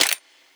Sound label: Weapon Foley